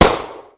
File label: shot; SnootGame